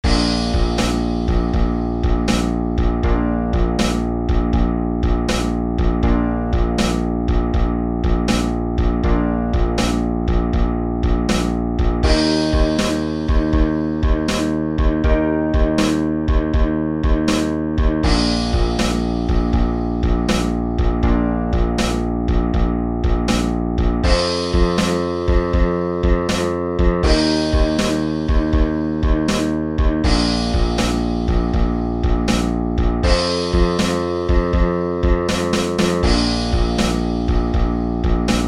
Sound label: 12 bar blues in bb